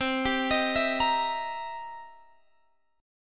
C 7th Sharp 9th C Guitar Open G

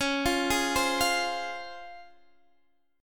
C sharp Major 7th C sharp Guitar Drop D

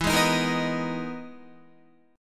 E-Major Sharp 11th E, Guitar; EM#11 strummed; JGuitar

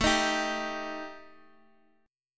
Eb Major Flat 5th A

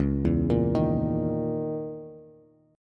Db Minor 6th Add 9th Db down Bass; Dbm6add9 arpeggiated; JGuitar

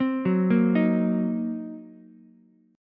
Eb Minor 6th, down, Ukulele; Ebm6 arpeggiated; JGuitar

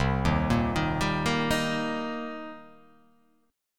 C Minor 7th C down

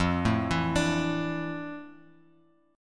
F Major 13th Bass Standard; arpeggiated; JGuitar

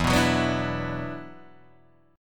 E 7th Suspended 2nd, down, Guitar, Open D

